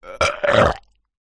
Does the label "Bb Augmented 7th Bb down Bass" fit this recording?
No